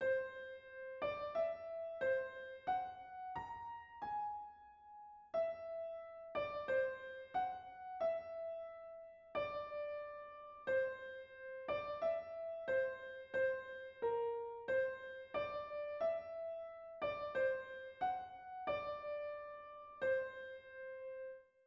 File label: Melody Wholetone